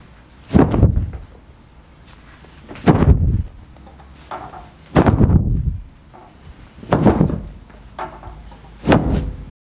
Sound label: Towel Flutter